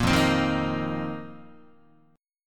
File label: F 7th Suspended 4th F down Guitar